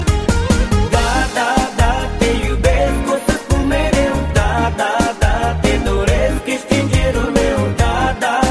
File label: daaaaaaaa, ringtones